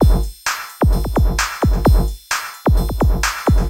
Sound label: Electro Break